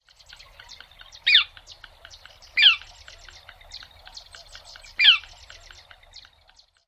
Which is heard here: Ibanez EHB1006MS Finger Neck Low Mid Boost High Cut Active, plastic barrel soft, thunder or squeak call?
squeak call